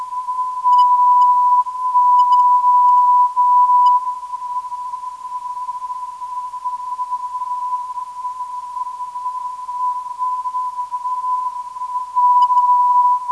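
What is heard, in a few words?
non-directional beacon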